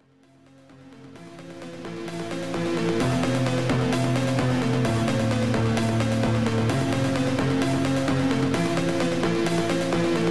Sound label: Atmosphere winter